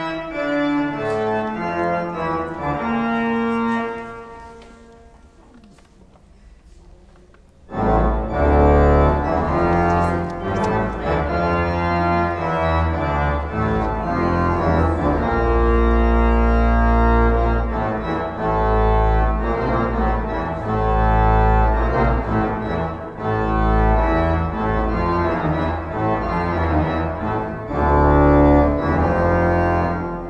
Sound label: cathedral organ practice; southwark